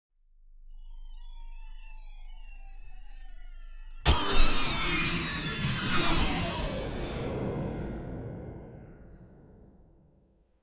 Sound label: magical spell backfiring on the caster